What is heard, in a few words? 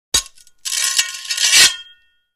Sharpen the sword with stone; cold weapons